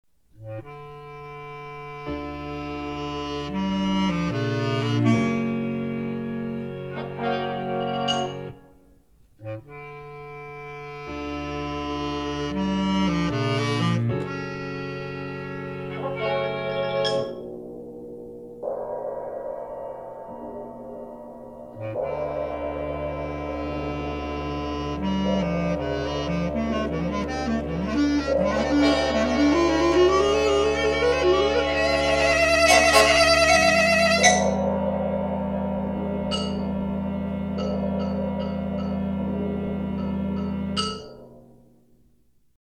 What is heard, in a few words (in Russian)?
Kolokolchik